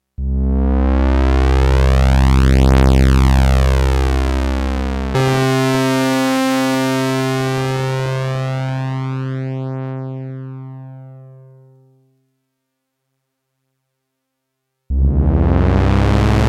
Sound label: Filter EG VCO2 Modulate